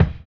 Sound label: LO FI BD, Drums Dance & Groove; USB Soundscan vol.11; AKAI